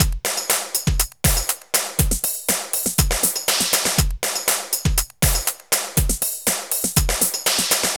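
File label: drum loops, left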